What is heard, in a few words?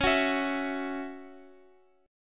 C sharp Minor Double Flat 5th C sharp Guitar Open F; C#mbb5; strummed; JGuitar